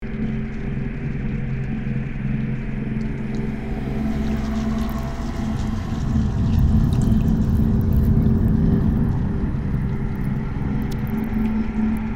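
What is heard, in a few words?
Ambience Access Hallway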